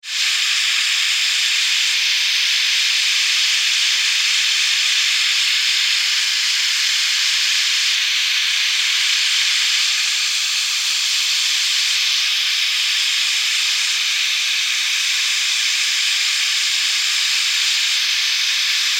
Alien, Jungle, Insects, Creatures, Scifi; 5.1

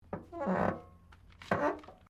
CAR MAZDA RX7 SERIES3 EXTERIOR DOOR CREAK; Car Mazda Rx7 1983 Series3; Cars (Sound Effects:Vehicles)

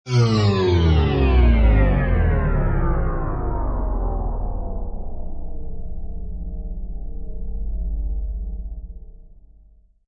Low Dive, Deep diving sound.; Rises and Dives; Sound Design

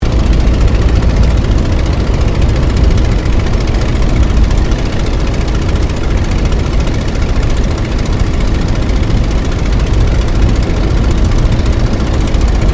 AIRCRAFT RYAN PT22 FLYING INTERIOR; Airplanes; Vehicles; 1942

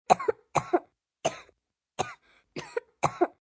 Cough Toddler, Cough, child, light (human voice); Sick Sound Effects; Sick (Sound Effects:Human); VoiceBox; Twistedtracks

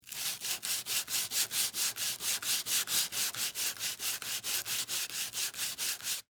Sand Paper Wood; Hand Tools (Sound Effects:Tools); BlastWave

